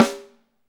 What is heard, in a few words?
SD, KIT Real-Kit; 90 s Sample CDs; Sound & Vision - Gigapack I CD 1 (Roland)